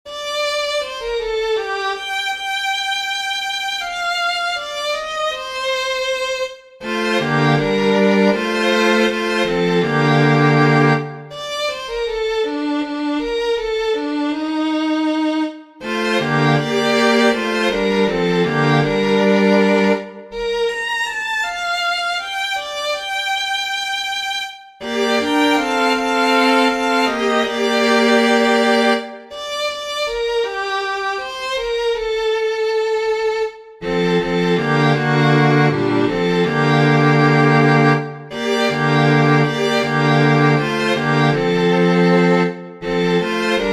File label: 3 strings; 將進酒